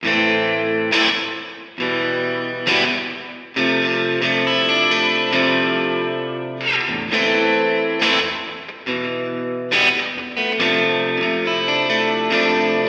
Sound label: Nux MG-30 Reverb Plate